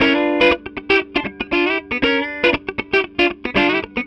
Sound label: Funky Blue, Rock Guitars; ACID MUSIC